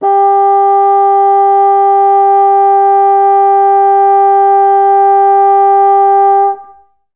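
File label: bassoons sustain G3; Best Service; Peter Siedlaczek's Advanced Orchestra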